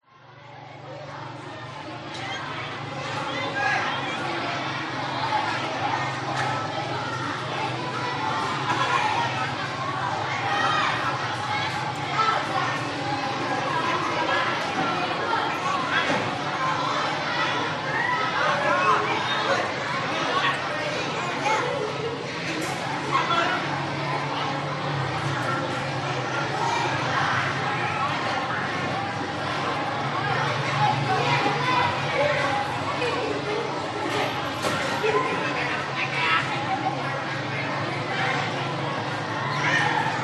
public places school playground busy kids children walla vietnam